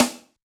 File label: Open Rim Shot, Yamaha DJX 2B